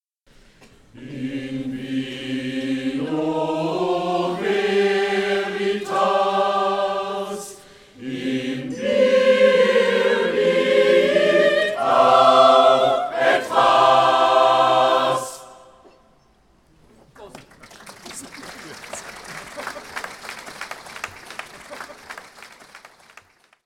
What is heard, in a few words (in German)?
Trinkspruch, Chor; Chorgemeinschaft Freistadt und Hans Sachs Chor Wels